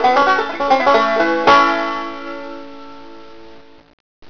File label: BANJO